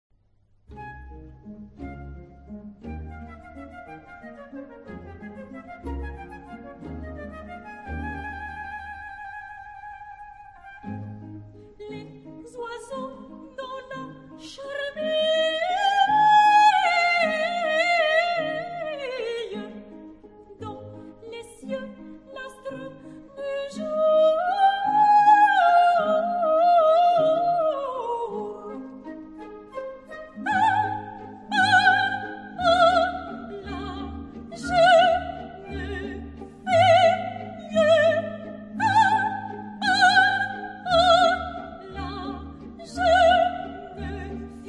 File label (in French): Les oiseaux dans la charmille Soprano; Les oiseaux dans la charmille (Contes d'Hoffman); Jacques Offenbach